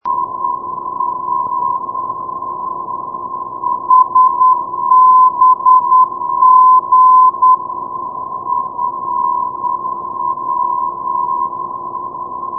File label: ndb salzburg; sbg 382.0